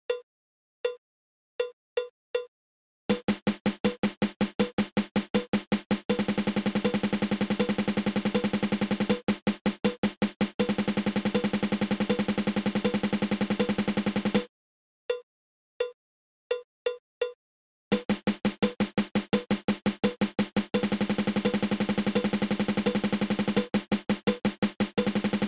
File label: Double Stroke Roll